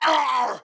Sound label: Boss Zombie Die; ZombieBattle; audio zombie